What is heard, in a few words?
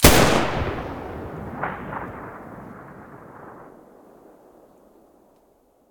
muzzle g36 stereo, weapons